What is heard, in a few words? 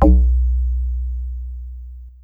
BASS Thick bass